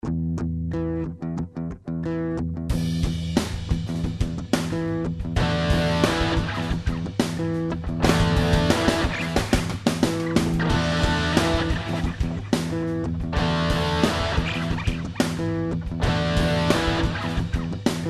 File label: hard funk